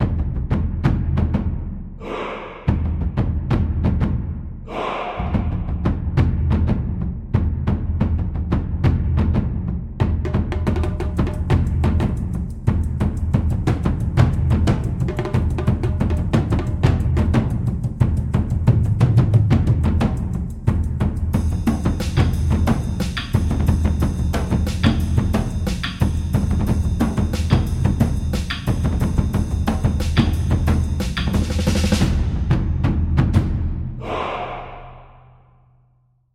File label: world of drums